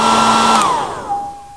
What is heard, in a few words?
Dryer Off; 1997 MMX LAN PACK (win 95); Army Men